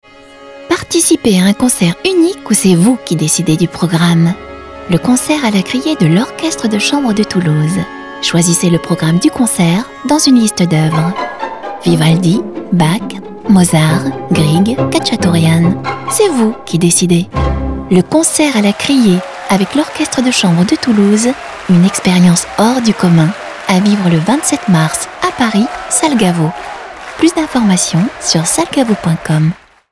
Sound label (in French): orchetsre de chambre; toulouse; fvrier